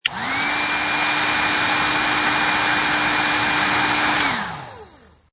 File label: Heat Gun Strong Click, Heat gun, long shot, high power, with key click on/off; Also works well as a food mixer, drinks mixer or mixmaster; Lynne Music